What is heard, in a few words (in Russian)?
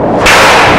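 выстрел из винтовки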